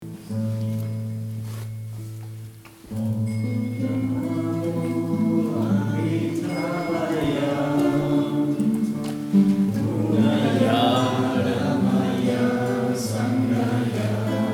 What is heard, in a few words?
Om Namoh Amithabaya